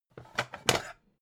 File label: pan take slide short; objekte; topf